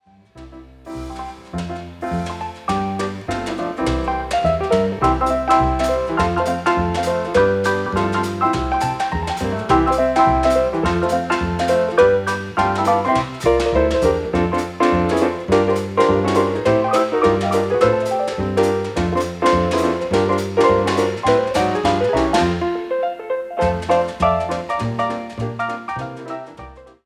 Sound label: The Piano Quartet